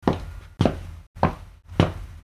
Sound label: fstep